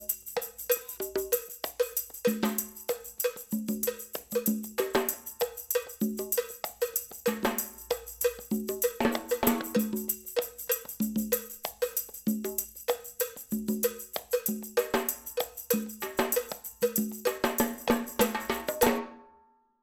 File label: Percussion